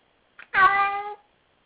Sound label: cat meow